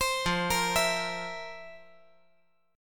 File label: F Major 7th Suspended 4th